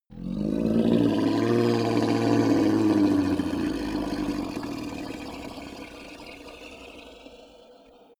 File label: hellhound roar growl; kreaturen